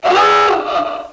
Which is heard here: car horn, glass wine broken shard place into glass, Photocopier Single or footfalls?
car horn